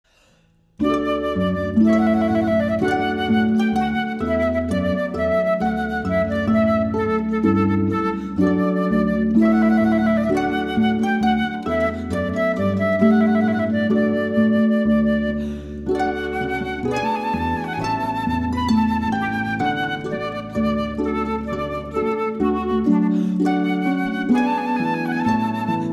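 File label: Trumpet Voluntary Harp Flute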